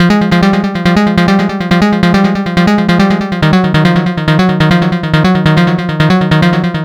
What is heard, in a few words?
NRG Arp, trance